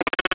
croak

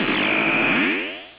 time gate

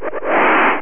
grunt

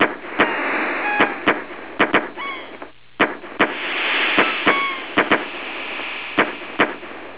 balloons

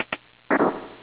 door

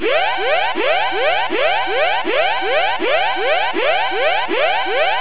alert

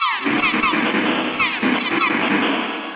destruct

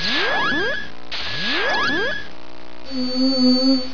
heal

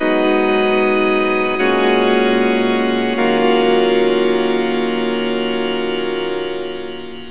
organ

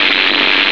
electric

